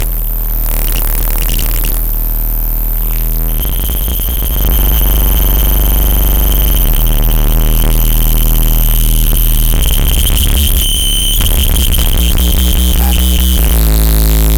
glitched tones Machine Gone Wild

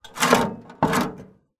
industrial shovel into metal wheelbarrow

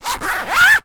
sport badminton racket cover sleeve zipper; zapsplat

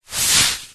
foley sleep mask slide on or off over eyes

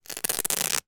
shin pads velcro rip; foley

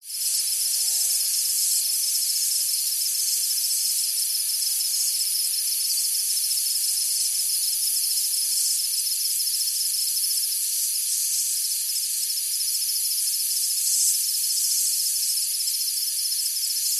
animals insects night summer tropical; eq layer for low level background

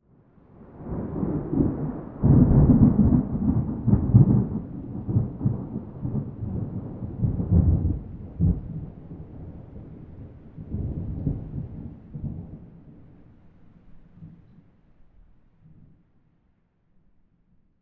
nature thunder

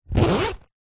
lunchbox fabric zipper; foley

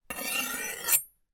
household knives large scrape together

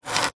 office quill pen draw line

